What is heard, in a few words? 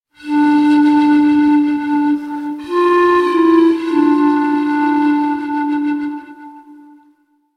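sounds with an effect of completion, ending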